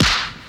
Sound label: Snare; 音MAD